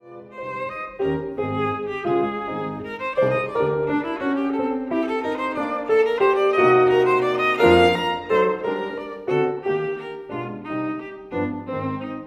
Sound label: VIOLIN; Trinity College London violin exams for 2016-2019; Bridge: Country Dance